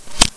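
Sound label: sniper reload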